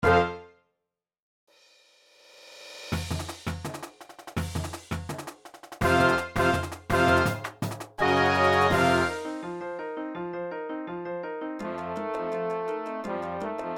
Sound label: The Music Box